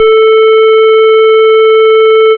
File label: TECHNO; E-Lab; X Static Goldmine 1